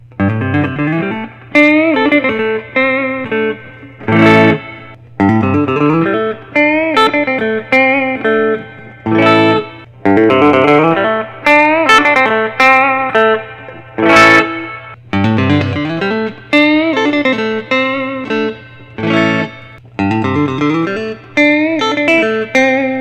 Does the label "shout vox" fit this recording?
No